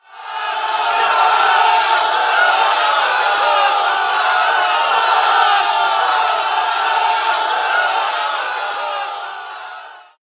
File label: Hollarin